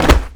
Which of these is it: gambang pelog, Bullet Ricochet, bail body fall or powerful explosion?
bail body fall